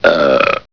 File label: burp beep maximize